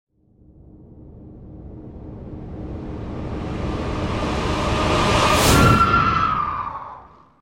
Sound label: Eerie Choir with Laser Gunshot; Hollywood Edge Sound Designers Tool Kit